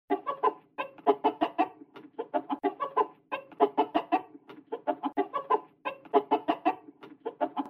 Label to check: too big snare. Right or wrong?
Wrong